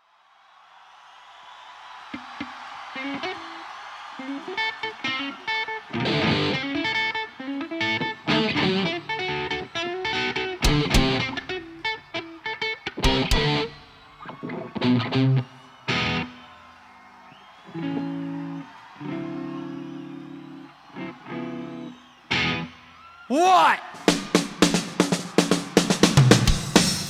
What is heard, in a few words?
Encore Jam, Live at Arco Arena; Sacramento, CA; January 11th, 1992